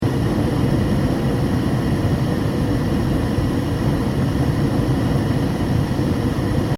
HOTPLATE GAS PALOMA OPERATE HIGH LOOP, Portable gas cooker; Kitchen; Household